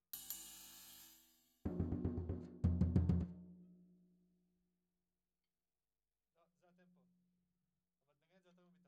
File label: Floor